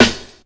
Snare, Drum&Bass

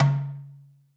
DARBUKA, AFRICAN DARBUKA

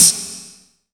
MIX SD; DRUM RYTHM; AKAI S6000 CD-ROM - Volume 1

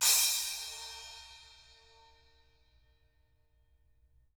SPLP HIT, SPLASH CYMS; SPLASH 10

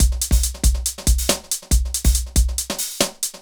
Synth Loop BPM140

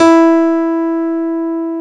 PNO, Electrics, Grands; Club-50 - Foundations

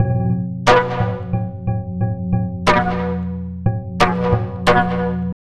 tabla, 90 bpm; Zero-G; Pure Tabla

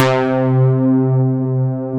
WS analog, Korg O1/W; WS ANANALOG

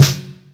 Snare Deep, Yamaha DTXpress Acoustic Snare

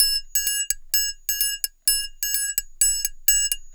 Triangle Rhythm, Asia; Sonic Foundry (Sony Creative Software); Continental Drift Aftershocks

